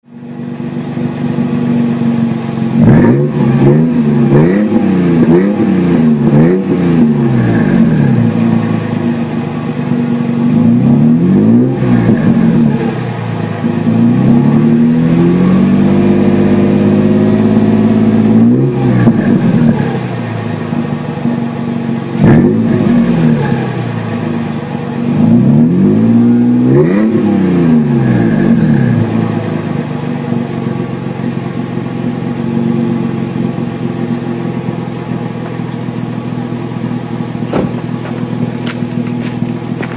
super 50 series big block 2 mufflers aggressive sound; flowmaster